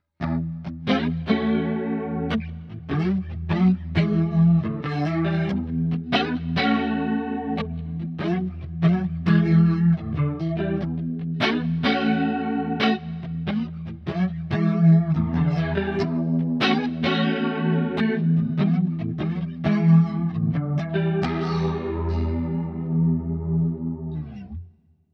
Eventide Tricerachorus; Roostervibes

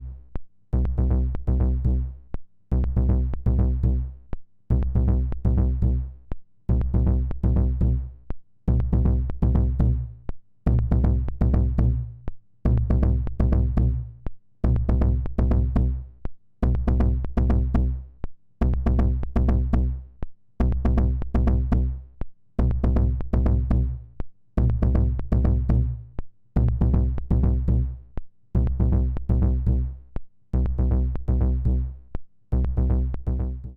KICK BEATER; Snazzy FX

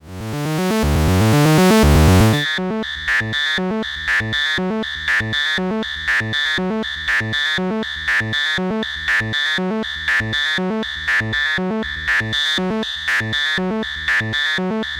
Rossum Electro Music Morpheus Expander

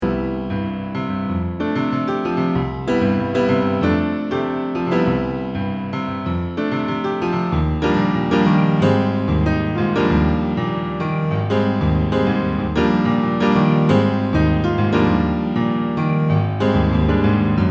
Grand Piano

